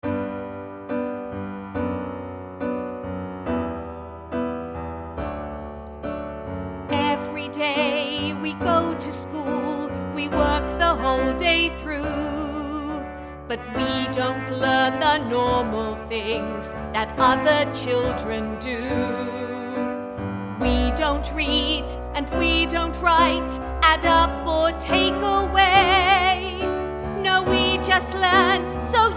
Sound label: vox